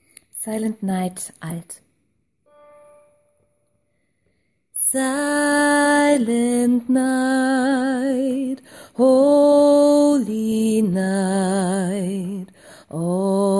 Silent night Alt, gospelchor

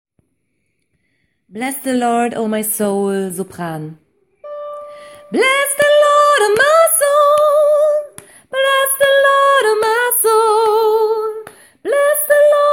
sopran, gospelchor

